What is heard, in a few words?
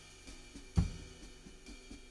Room A